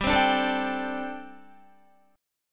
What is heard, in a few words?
G Suspended 2nd Suspended 4th, Guitar; Gsus2sus4; strummed